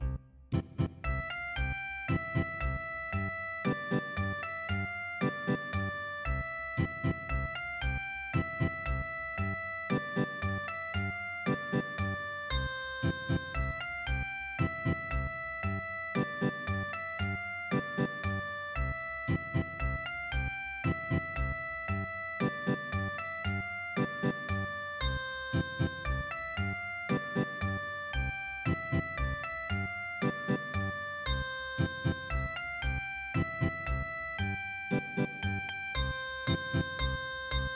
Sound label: Valse Ethnic Guitar; midi; traditional